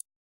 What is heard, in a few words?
dice ball drag